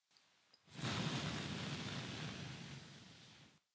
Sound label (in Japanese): 耳舐め音声 (LR逆); 古き城のドラゴニート2